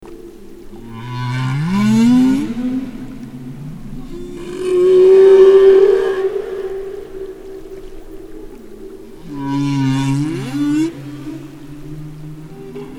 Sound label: Whale song